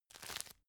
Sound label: tissue grab soft